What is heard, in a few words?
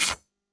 card flip